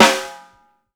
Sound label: CHROME TAM, chrome Tama; Sampleheads; New York City Drumworks VOL-1